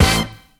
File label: stab; Zero G; Man Machine